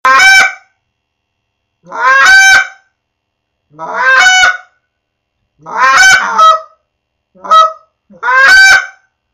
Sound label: Canada Goose Greeting Call; ORION